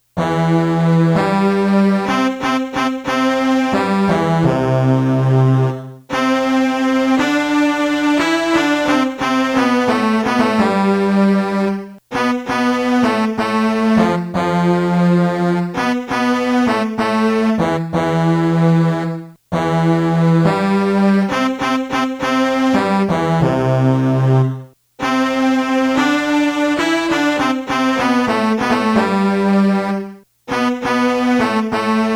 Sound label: melody